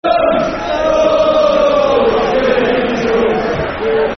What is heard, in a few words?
Football Chants (Soccer Songs), Cardiff City; fanchants-com